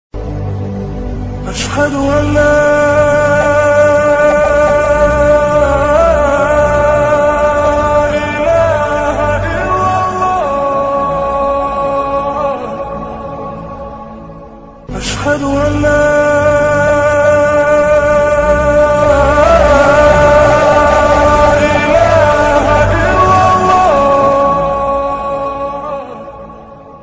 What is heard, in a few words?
beautiful islamic ringtone naat sharif ringtone arabic